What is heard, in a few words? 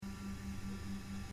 working, gas